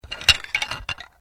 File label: Bones Human Move